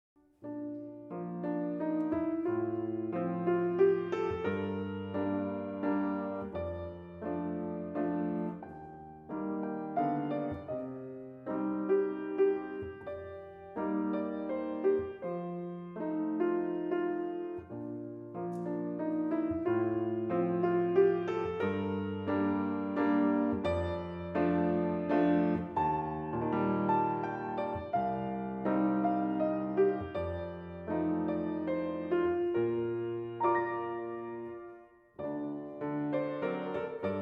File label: piano solo; GCSE Music Showcase